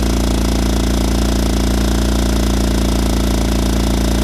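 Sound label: KART exterior ON IDLE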